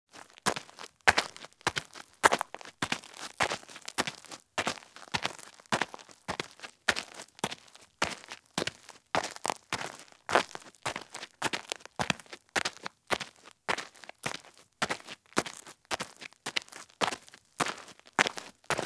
Women's High Heel Shoes Walk at Very Fast Pace on Roadside or Driveway Gravel; Sound Ideas; Footstep Sound Effects